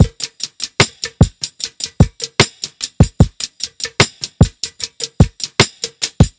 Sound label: Drum Loop 75BPM